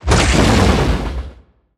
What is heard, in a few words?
bighorn spit